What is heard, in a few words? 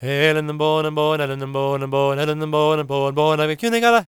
GAELIC, World Voices; ILIO; Vocal Planet